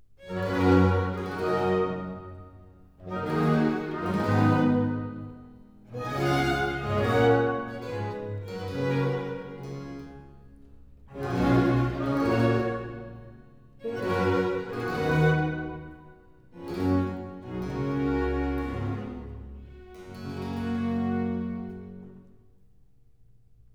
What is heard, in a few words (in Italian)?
Prelude Larghetto; Terpsicore HWV 8b